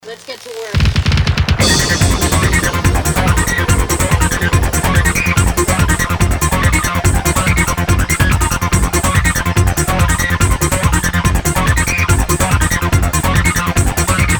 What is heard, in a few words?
indoor, progressive trance